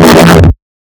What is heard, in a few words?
Snares, Alesis Performance-Pad